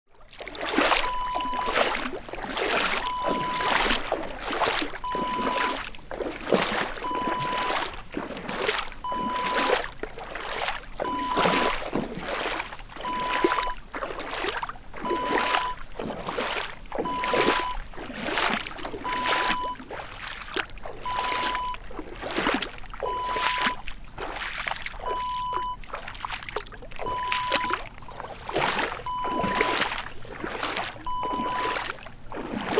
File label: footsteps in water